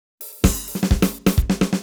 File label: Fill Ins 128BPM; Vengeance Samples; VEH2 Loops